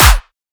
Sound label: drum snare classic riddim; Virtual Riot; Drum Hits